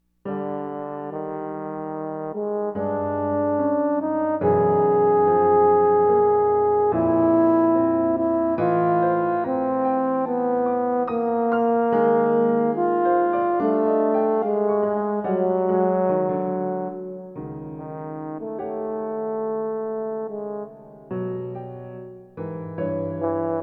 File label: Horn Capriccio beginning